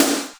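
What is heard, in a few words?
grab snare, Boss DR660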